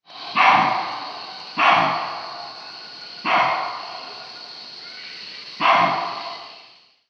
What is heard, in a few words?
baboon; sounds of the wild